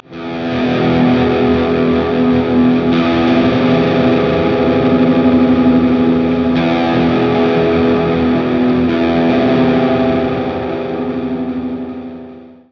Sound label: Forest Grunge